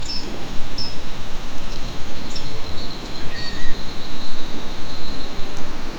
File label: thumps 80 Hz